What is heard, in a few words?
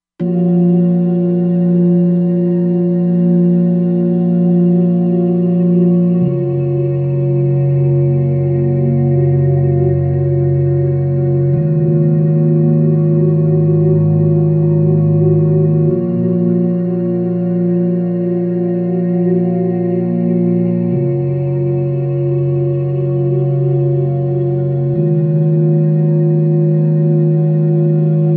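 pad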